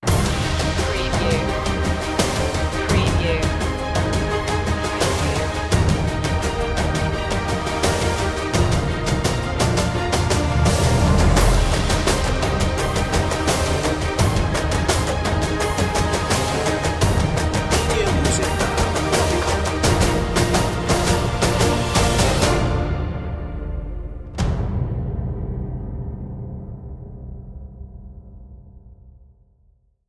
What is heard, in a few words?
in a near future no choir